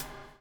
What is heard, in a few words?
BUBL, BUBBLE; Spectrasonics - Backbeat